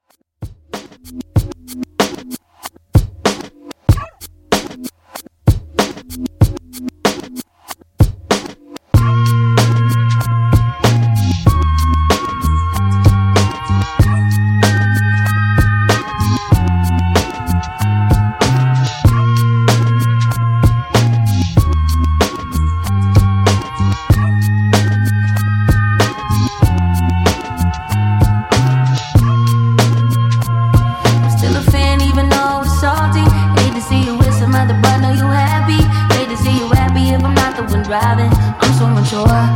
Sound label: Top40 Redrum Rework 95 Bpm Clean